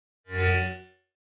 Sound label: Mouse Over Elect